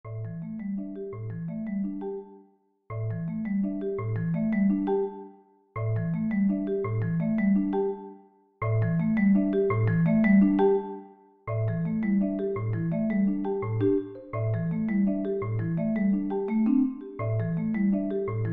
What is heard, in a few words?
marimba Duet